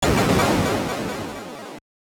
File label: Big core implosion; Gradius; MSX SCC